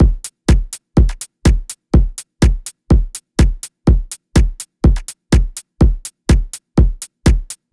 House Drum Loop 124 BPM; 99Sounds x Monosounds; Dance

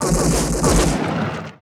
SHOT SEQ; Total Control - Action Cutz

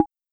Bongo Lo, Roland TR-33

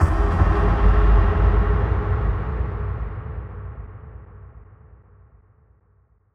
Impact

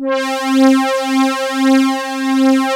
SYNTHEX SYN; East West - Global Sounds; CEE SYNTH

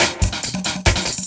LOOP SD, 140 BPM; Ueberschall; Drum & Bass Resonance

